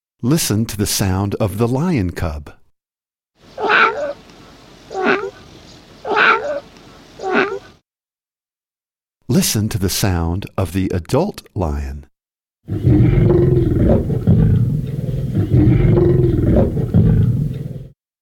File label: Baby and Adult Lions Sounds